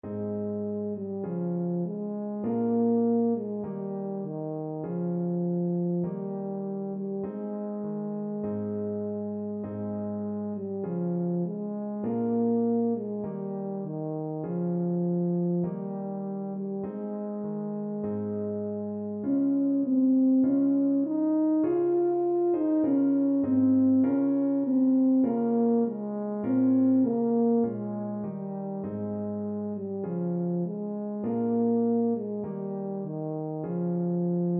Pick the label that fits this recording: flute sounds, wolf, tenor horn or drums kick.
tenor horn